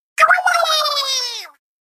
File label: girl hoowee; inkling